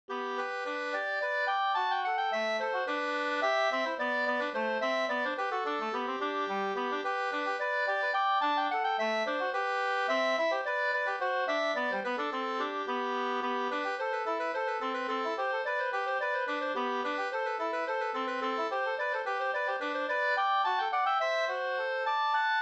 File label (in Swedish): slangpolska 2st